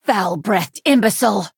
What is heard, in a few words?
attack; high rock summoner